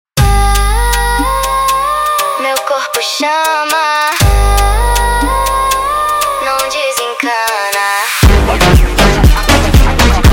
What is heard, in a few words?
Montagem sped Up, funk